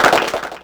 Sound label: Clapbox Pitch 4, clap; mpk mini presets; Ableton Foundation FreePacks